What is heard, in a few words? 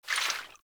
Vial Foley; Warframe; Warframes; Alchemist